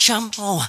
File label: "shamone", SUNG